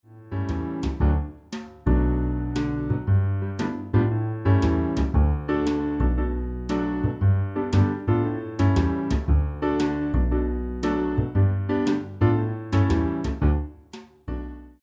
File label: Accompaniment